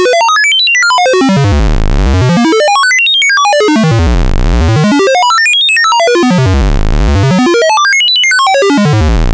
sample and hold lfo controlling vco, analog synth; analog synth 101

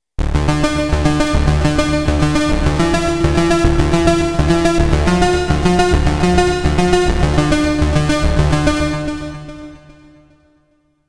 Another bass line; synth; analog synth; Penetrovisk

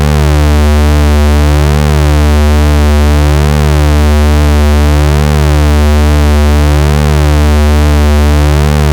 vco pulse width modulation, analog synth; analog synth 101

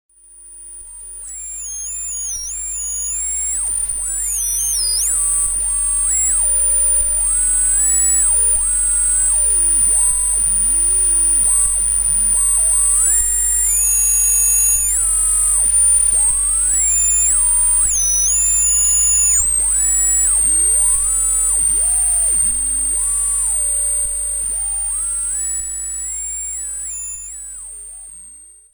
short wave radio (dry); analog synth

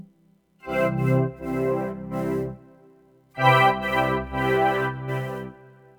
vocoder sample; analog synth

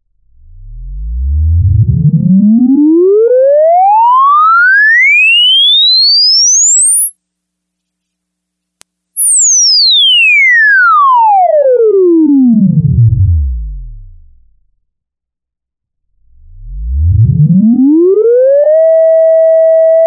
Internal Sine Oscillator; analog synth; ring modulator

